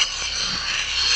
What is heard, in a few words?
ROTOCUT; EFFECTS; CMI Sound Library Rev 1.3